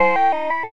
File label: monster mecha